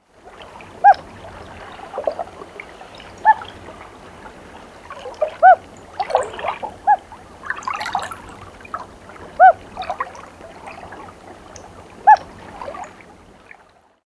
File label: Hoot